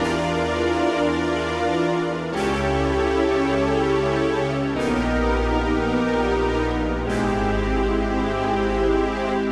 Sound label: Pad, Synthesizers